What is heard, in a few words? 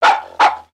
Pet Dog Small Alert; creatures; pets; Lego